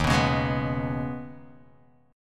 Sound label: D sharp 7th Suspended 4th Sharp 5th